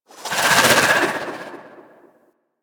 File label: Spear Projectile, Weapons; Warframe; Tenno; Priest Spear